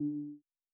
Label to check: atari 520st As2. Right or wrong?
Wrong